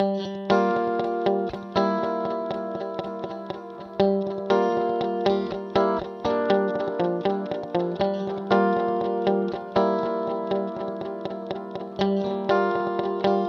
Guitar Yamaha D5000 T60 Mod Bounce Vintage Digital